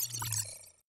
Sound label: system out; EMXArms